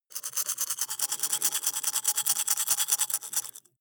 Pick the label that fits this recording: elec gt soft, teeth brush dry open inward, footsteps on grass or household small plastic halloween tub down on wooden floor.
teeth brush dry open inward